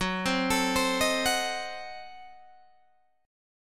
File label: Gb Diminished 7th Guitar Open G